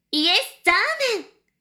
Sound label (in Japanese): しこしこ音声; 牧場戦腺; 少尉